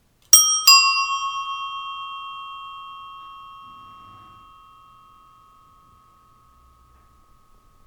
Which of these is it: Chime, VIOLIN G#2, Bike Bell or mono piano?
Bike Bell